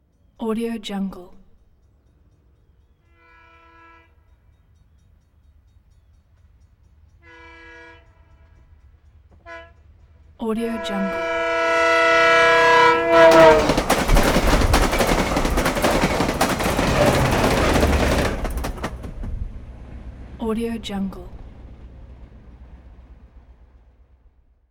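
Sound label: commuter train drive by; hollywoodedge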